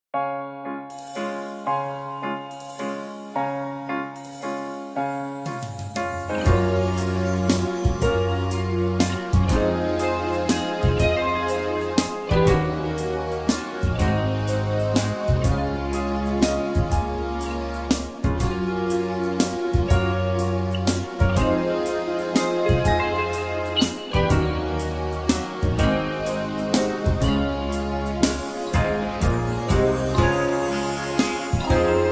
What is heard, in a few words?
Db No Backing Vocals, backing tracks